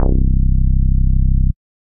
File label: East End Bass, Operator; Instruments; Ableton; Factory Packs; Synth Essentials